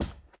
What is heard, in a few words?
carpet step; Golden Crayon Sounds